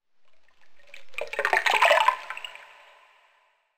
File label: water